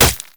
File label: basic attacks; Zyra